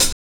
CHH, DRUMSAMPLS, Disco Fever; Best Service ProSamples vol.48 - Disco Fever; AKAI

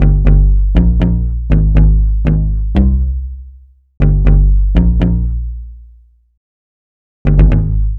Bass Line; Eclectic Electronica

